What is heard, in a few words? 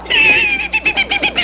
meep meep; Three Stooges